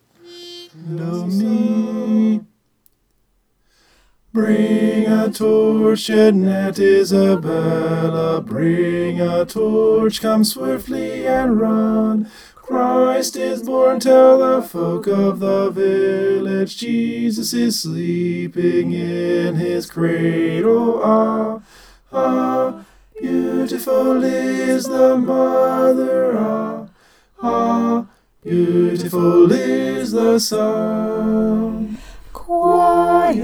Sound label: tenor alto